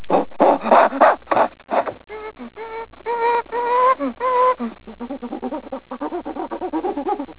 monkey; svencoop

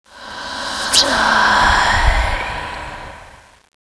player leave; tfc; soldier4hire

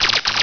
build

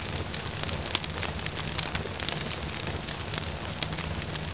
candle; svencoop; adamr

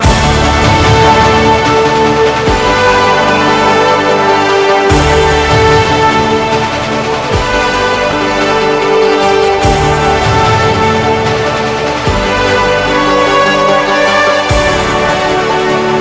victory; tfc

